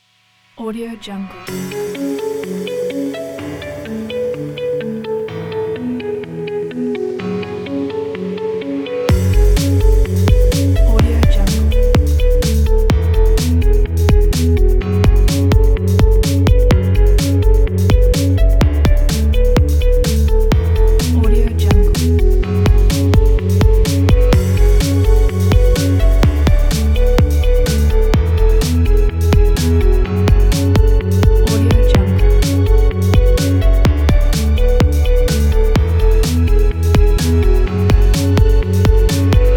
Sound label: inspiration dramatic breaks